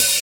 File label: dark open hat one shot a sharp key, open hat one shot tuned to the A# note